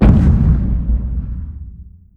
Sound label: station explosion small; weapons; Stellaris